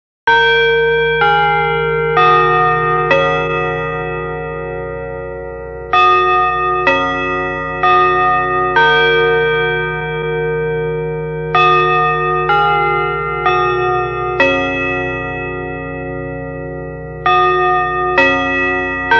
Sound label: chime; denon